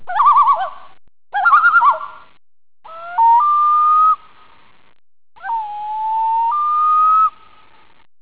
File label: loon calls; paddlecanada